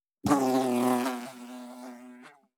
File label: Insect, Fly, take off, flying away, landing; Audionym; The Fly